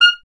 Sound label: clarinet F; Miroslav Vitous; Solo Instruments VOL-1